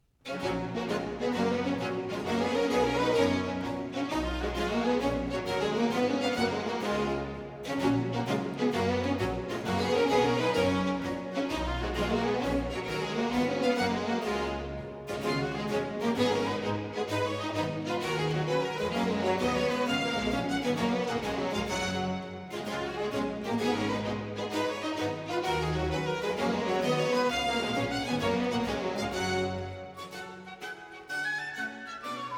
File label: Suite in G major; Water Music Suite No. 3; English Baroque Soloists, Sir John Eliot Gardiner; Suites for Orchestra HWV. 348, 349, 350, "Water Music"